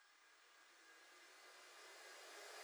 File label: FX Uplifter; Multi Drum Loop 04